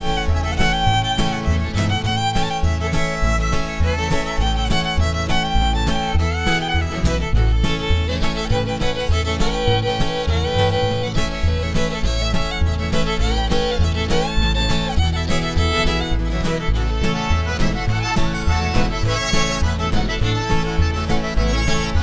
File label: musicbox